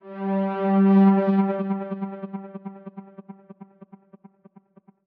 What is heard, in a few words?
Special-Photo Impossible-Triangle Synth; viewfinder